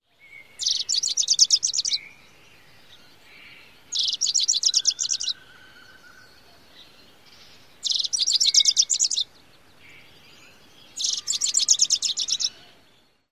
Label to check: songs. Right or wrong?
Right